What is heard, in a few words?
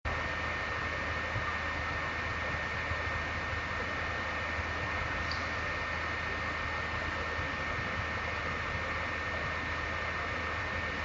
ps4 new